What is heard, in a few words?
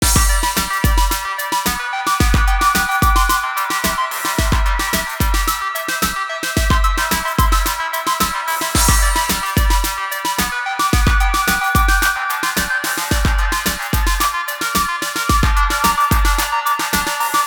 GarageBand Midi